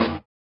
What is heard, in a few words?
SWUSH; Spectrasonics; Metamorphosis